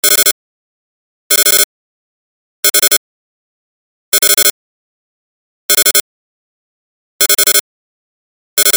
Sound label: bugs; RTcmix FMINST. instrument